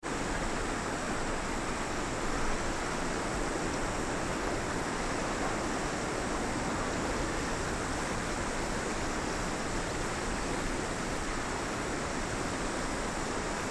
river

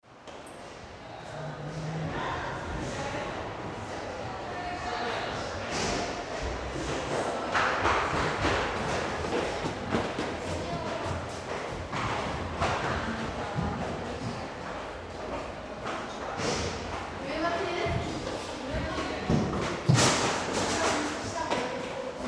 school hallway

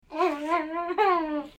baby laughing

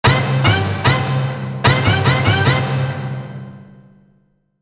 JINGLE

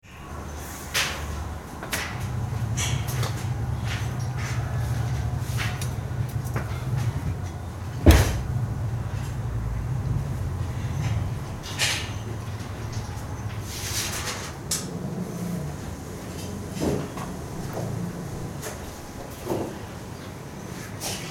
construction site

